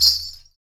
METAL, Afro Shakers Ankle Pods; Roland LCDP14 Africa VOL-2

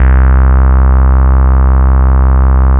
BASS R, Drum & Bass 175 BPM; Ueberschall; Drum & Bass Flash Trackz

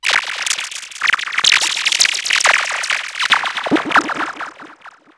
Modular, sci-fi

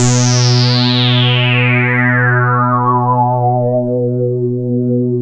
JUNO SWEEP; E-MU Formula 4000 Series Vol. 3; Analog Odyssey

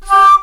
dual flute; East West; Global Sounds

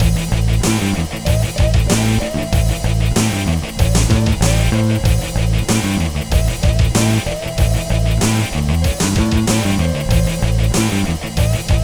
Bass Grooves; USB Soundscan vol.30; AKAI; BALBOA

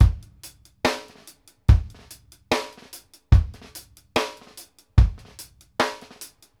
KICK, REGGAE 72; Sampleheads; New York City Drumworks VOL-1

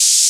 DRM OLD, dance synth

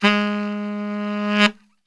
G#1 SAX; Best Service; Brass Super Section

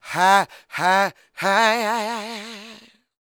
SOUL, Vocal Planet, Blues & Soul; CW SOUL

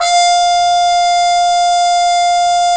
BRS CPM TP, Cup Mute Dry; Roland L-CDX-03 Disk 2; Cup Mute Tpt

